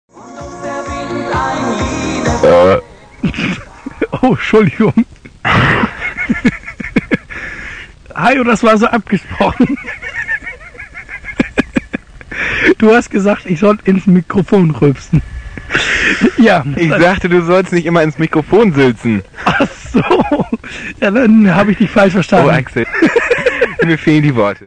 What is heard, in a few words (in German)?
ruelpser; radiopannen